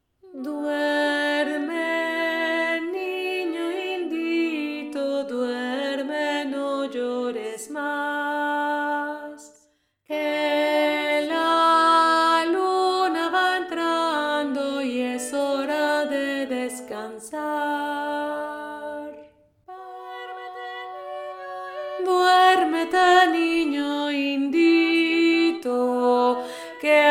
DUERMETE NINHO INDITO VOIX MILIEU; Duérmete niño indito – Trad. Guatemala; Une très belle berceuse guatemaltèque

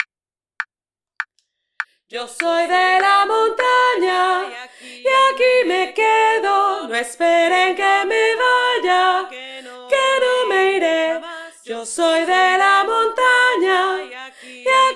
VOIX AIGUE

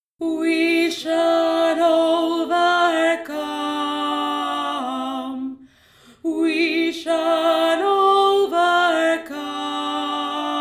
VOIX 1; WE SHALL OVERCOME

